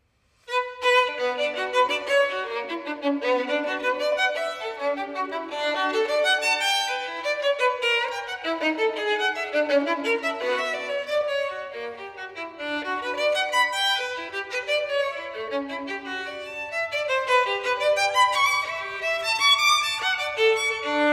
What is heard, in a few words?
Courante, violine; bach